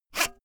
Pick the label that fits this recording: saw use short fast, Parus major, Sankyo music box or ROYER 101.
saw use short fast